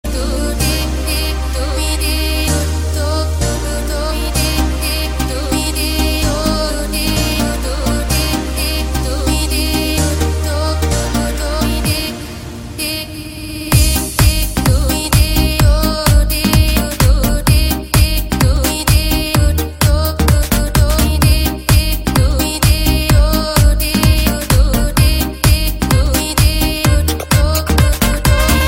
Nice Dance Beat

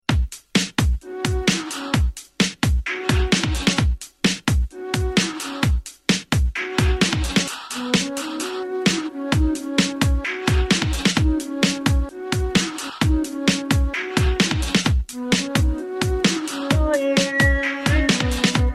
Nice Flute With Beat

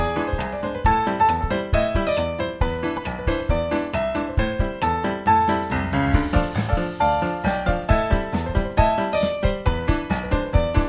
Ragtime Piano

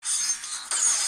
Arrow sound

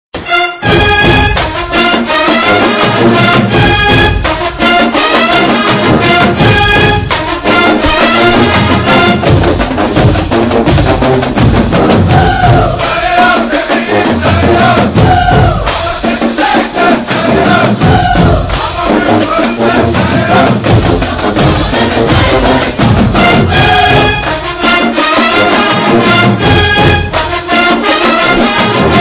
Drumline; Gsu

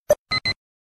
Chirp

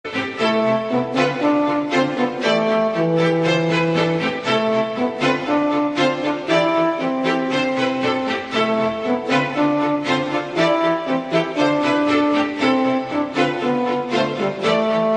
Violin Remix

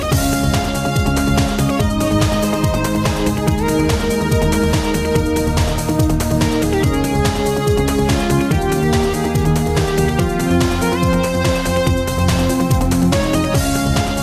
Medival Jam

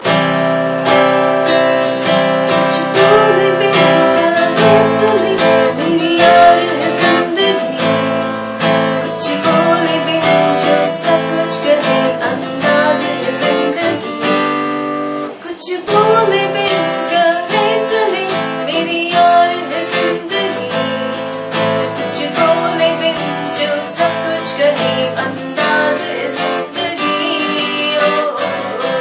Passion Guitar Tone

